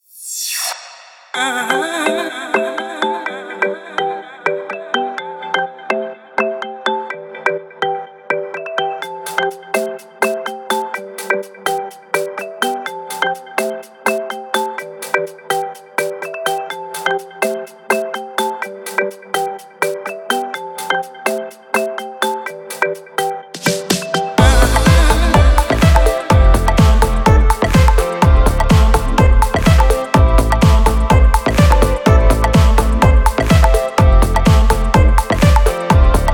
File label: house electronic techno; By Burak Sound Bank; house electronic techno vol 7